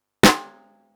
Snares & Claps, One Shots

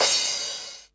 909 CRASH; drum

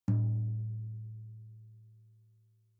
Toms; One Shots; Touch Loops; CosmicFunk

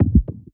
One Shot, Hits

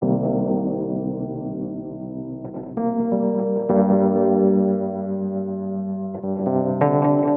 130 Cm7 Wurli Vibes, Keys; Loops; Touch Loops; BlissedOutBeats

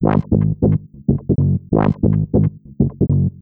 TECHNO BASS 140 A

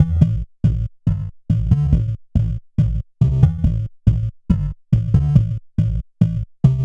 TECHNO BASS 140 A

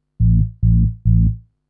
SNTH BASS TEKNO 140 A

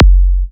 Minimal Kick, Drums, Kicks

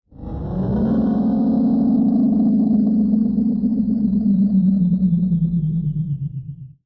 SPACE SHIPS; SpaceSounds

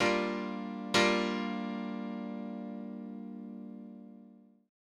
PIANO 96 G; OLDSCHOOL; Keys

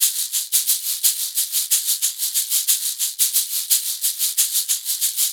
ETHNO EXTRA 90; ethno2

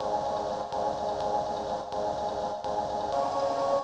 FX Industrial 125

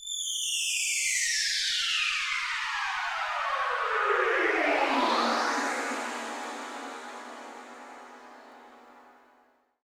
SYNTH PAD INDST 140 A, Industrial; Sphere

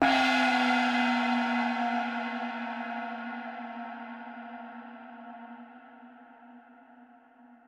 PERCUSSN ETHNO 125, Drum

